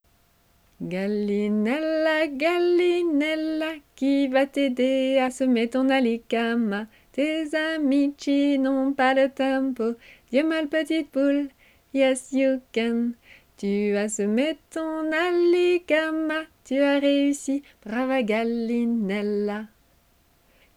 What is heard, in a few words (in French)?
Gallinella comptine; Comptine plurilingue « Gallinella »